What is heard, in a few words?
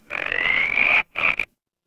animal frog